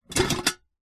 household medium small sized metal stockpot cooking pot empty pick up from hard surface